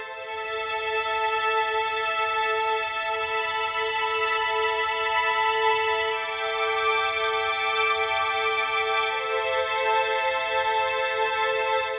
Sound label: Violins; скрипка